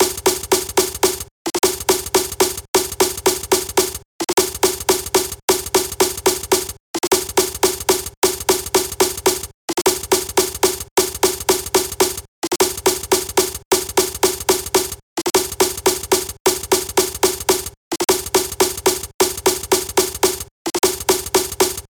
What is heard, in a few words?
175BPM Roller